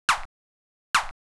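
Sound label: SNARE ELECTRO 140, Techno Drum; Techno2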